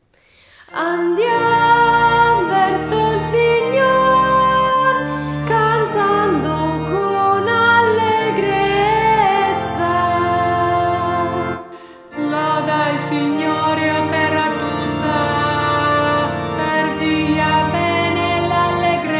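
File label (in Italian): salmo 99 andiam verso il signor contralti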